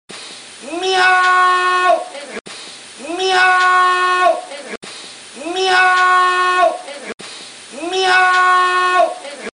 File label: meow